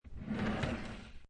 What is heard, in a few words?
Desk Drawer Open, Wood drawer in office desk being opened.; Drawers (Sound Effects:Household)